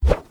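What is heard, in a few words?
attack shield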